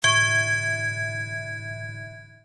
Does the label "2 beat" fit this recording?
No